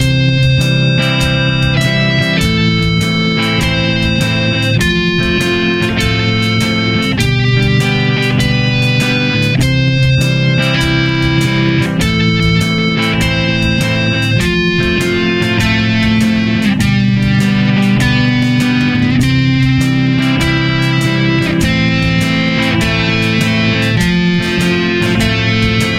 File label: roots and 3rds